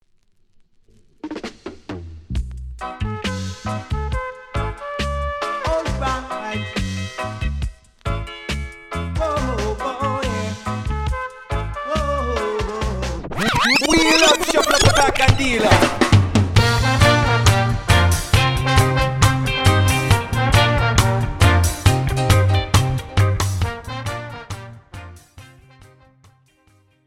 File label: Reggae